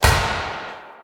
ball Guard Break Hit

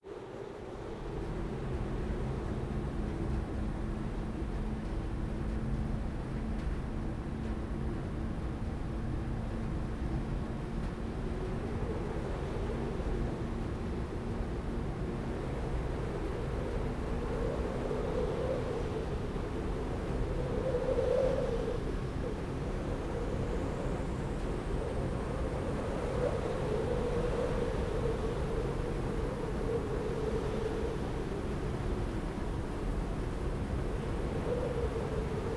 ambience; aeolos

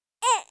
skill item attack

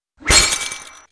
Attack Ice; Shua; Elf

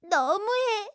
Lose Point

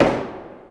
Defend Shot

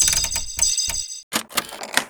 open; Fantara Gacha

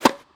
ball lob

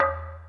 DING E4

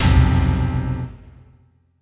item upgrade

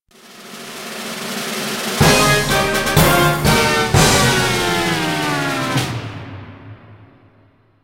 BGM game lose

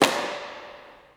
ball serve strong

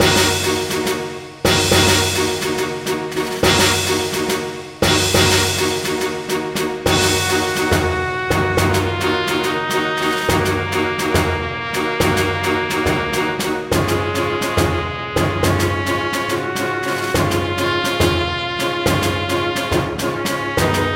battle mode

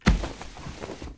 ball Guard Break Damage Rolling

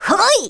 voice volley

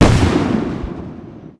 skill Cannon Attack smoke; lucy

